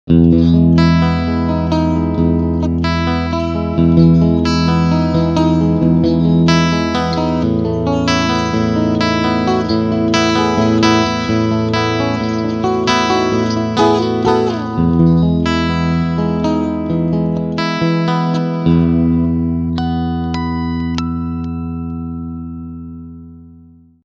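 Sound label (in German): Bridge Singlecoil und Humbucker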